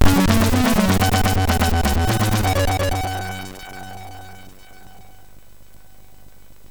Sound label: Jingle MOS6581R3